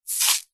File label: food plastic tub cake sprinkles tip movement single